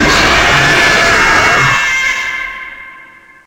jumpscare; trtf 3

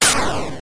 speed chute; sonic the hedgehog

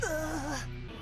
grunt; gohan

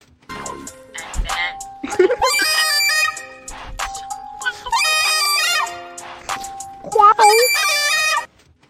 bad autotune; tiktok

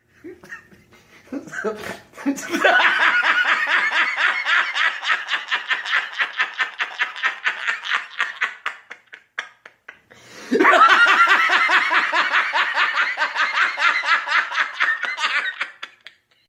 laughing loudly

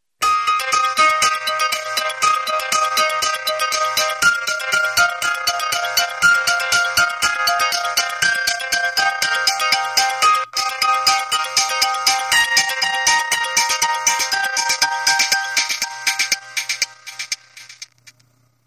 alarm dawn; htc

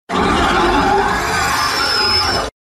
indoraptor

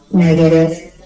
negative

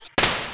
camera shutter sound; midi

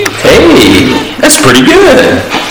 bass boost

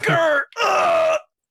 demon ugh

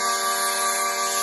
cars horn

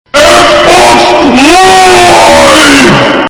x box live

